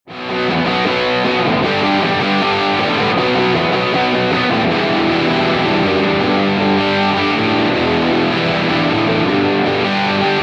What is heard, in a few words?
DRIVE, Guitar Rig; DRIVE BREAKOUT; GR5 Presets